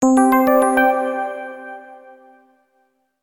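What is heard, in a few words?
electric piano jingle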